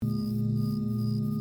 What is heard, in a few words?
tibet, tibetan singing bowls